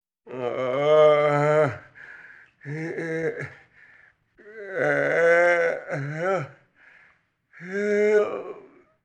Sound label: Injured person in pain and suffering